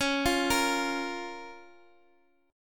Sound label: Db 6th, Guitar, DADGAD